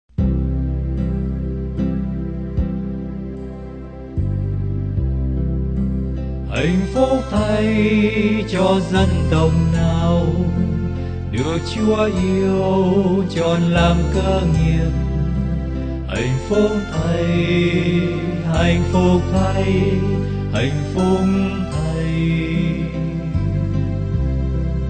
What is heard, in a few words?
BASS